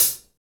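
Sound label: HH, hi-hat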